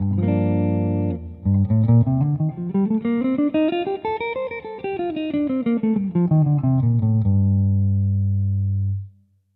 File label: Gmaj6; scales Gmaj